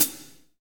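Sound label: hi-hat, fast rock, kit dry, Roland; Northstar; Drumscapes Roland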